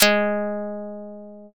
shamisen

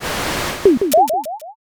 gacha roll

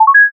success

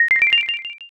score up

